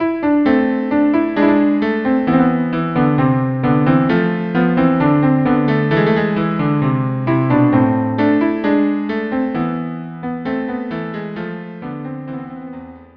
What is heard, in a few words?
marche cello duo; Jacquet de la guerre